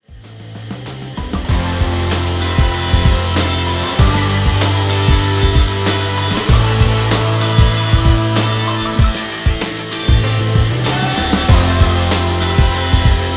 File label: instrumental